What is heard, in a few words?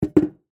Coffee Pot Down, Household, Coffee, Pot, Set, Down, Empty, Metal; Appliances; Blast Wave